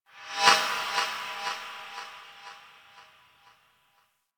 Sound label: Ship Metal Knock; Ambience; Warframe; Corpus Ship Remaster